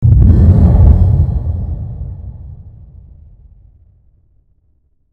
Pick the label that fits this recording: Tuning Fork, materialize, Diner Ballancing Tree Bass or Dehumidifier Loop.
Diner Ballancing Tree Bass